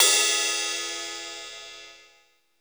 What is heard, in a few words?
KOMMERZDRUMS